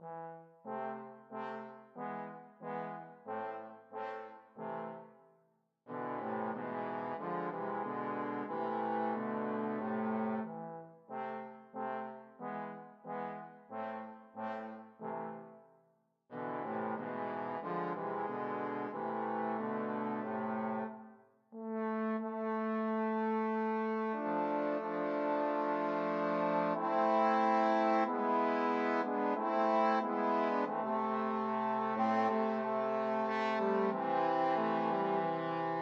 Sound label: go down moses trombone